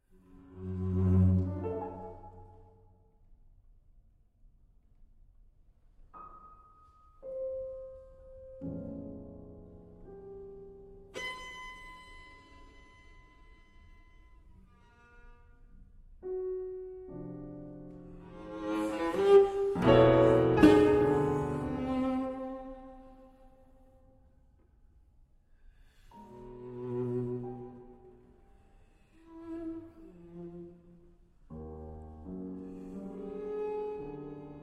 Little Pieces for Cello and Piano, Mäßige Achtel, Live; Op. 11; Schloss Elmau, Krün